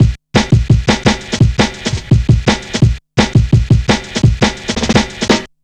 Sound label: 170, Drum Loops, Drum & Bass